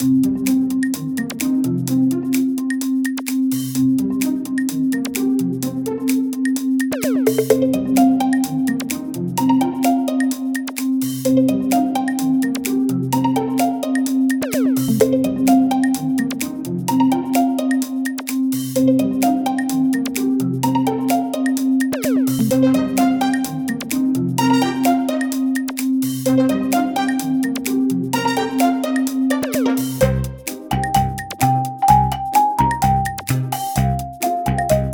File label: acoustic